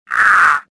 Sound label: choke